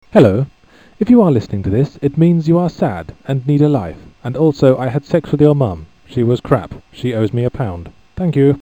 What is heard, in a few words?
orgasm